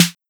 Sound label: Snare Jungle TR808; pitchglitch